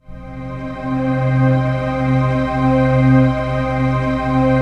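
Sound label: trance pad; Best Service; XX Large - Pads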